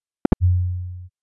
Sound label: iPhone Boot Chimes Chime